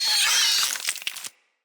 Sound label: root spider vocal death; Animals; Spiders; Vocals